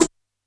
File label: Cutting Noise, Yamaha PSR-E323 SFX Kit